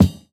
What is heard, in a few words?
Kick, jungle breaks drum sounds